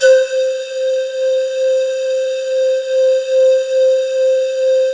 C4, ANALOG SEQ; Roland JV 2080

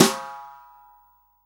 SWING SNARE CHROMATIC, Real Drum Kit; REAL DRUMS

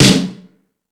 Sluggo Drums, Drum Machines

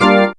organ, c, Garage Organ; Dance Synths

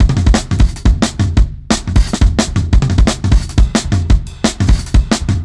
Rough Beat 4 Bar, Drum n Bass; KJ Sawka; Drum n Bass & Beyond

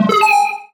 Sci-Fi Lasers - Droid Talk; Antidote Advent

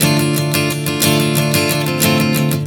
Strum 090 Dm, One Chord Strumming 090 bpm

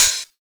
Open HH, Cymbals

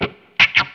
WAH LICK, Distortion Gtr; PlatinumPhattCD2; E-MU Producer Series Vol. 8 – Platinum Phatt

